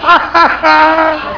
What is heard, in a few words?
laugh